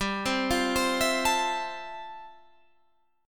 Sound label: F Major 7th G; Fmaj7/G arpeggiated; JGuitar